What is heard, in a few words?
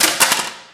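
physics clipboard impact medium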